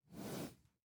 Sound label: sleeping; Rust